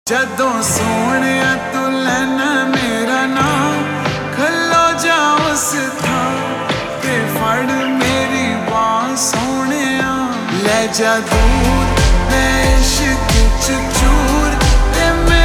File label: Slow Reverb Version Ringtone